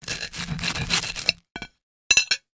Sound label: food toast cut then knife down on plate; sound effects one